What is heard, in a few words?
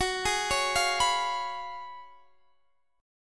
Gb Major 7th Suspended 2nd Suspended 4th, Guitar Half Step Down